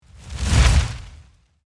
Torch Pass By, Fire, Torch, Pass, By, Heavy, Crackle, Whoosh; Flames; BlastWave